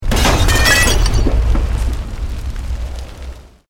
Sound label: Explosion Medium Brick Wood Glass